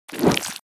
Big Monster Attack

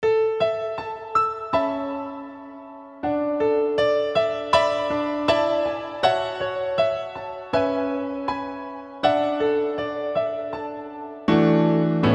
Piano solo

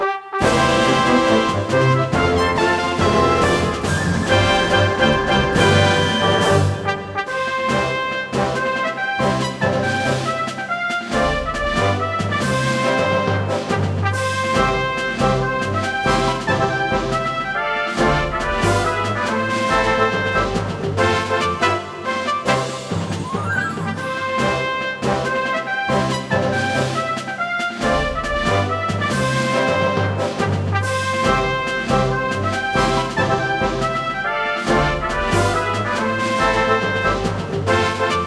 Get Key; Kirby TV Channel

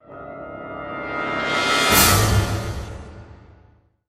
BRASS SWELL; transformers war for cybertron gamerip

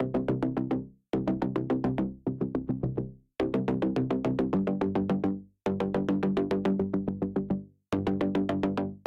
Tension Bass

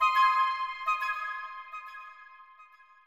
Musician Rebloom Flute

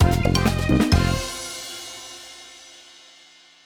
Lose; Hamsterdam; Level 2 Phase 2 Lose

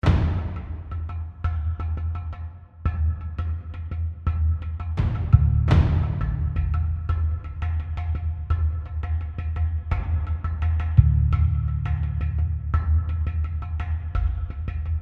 Tension; AB Tension 5 High Block 1

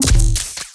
Grenade Launcher Fire Heavy; Robobeat

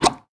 Kiosk Juice Squeeze

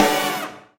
Combo Hit

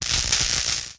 Shield shock; gamerip; shieldmaiden remix edition

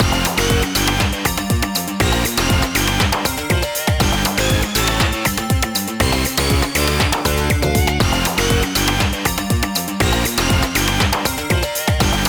Jingle Continue 120; Arcana Heart 3

